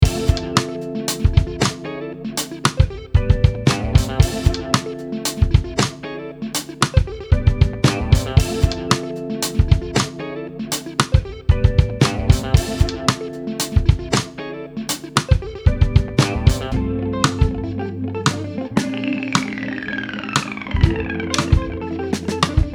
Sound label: No Bass